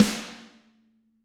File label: Snare Hard; apulSoft apTrigga2; Steven Slate Drums